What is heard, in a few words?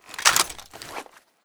ru-556 mag out, weapons